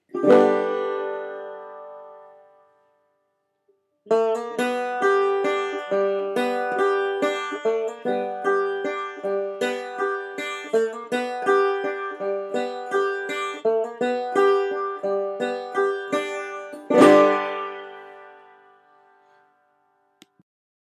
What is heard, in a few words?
slide roll, banjo